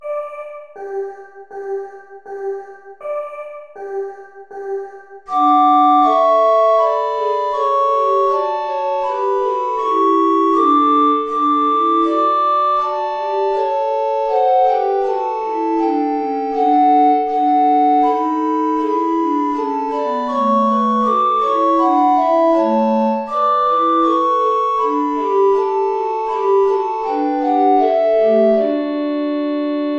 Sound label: play along without alto recorder